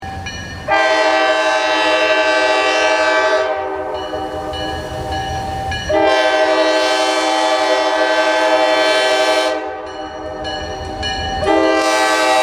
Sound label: Horn, Rail; Maine Rail